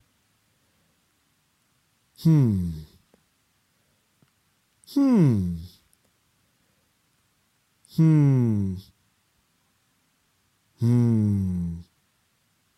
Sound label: Man Humming